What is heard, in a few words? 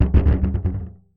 Marbles & Framedrum; contact mic; musicradar; contact mic madness; Raw